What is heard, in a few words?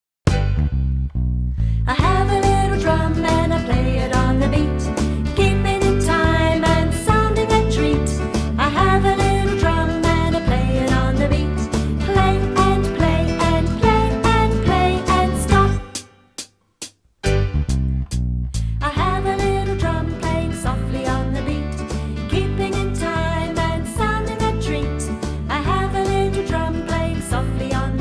i have a little drum